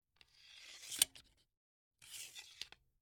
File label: nocked archery crossbow composite string functions bolt slide into rest set drag nock; crossbow1; Sonniss GDC